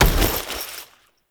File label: foley weapon fire; Warframe; Characters; Kahl